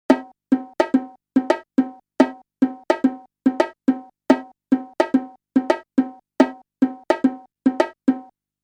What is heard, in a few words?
4 repetitions of the 5:3 polyrhythm; as used in the experiment; The learning and transfer of multifrequency patterns; Jeffery Summers